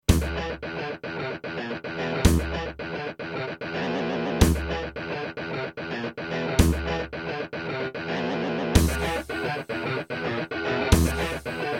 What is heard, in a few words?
V7 media fx; Doom